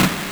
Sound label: Snare Doubled-up; Genesis